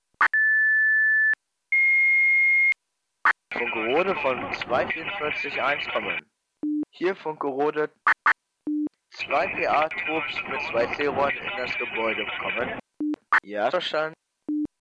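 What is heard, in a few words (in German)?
Feuerwehr Einsatz, Feuerwehrfunk; Funkmeldungen mit Einsatzgeräuschen; Spielhausen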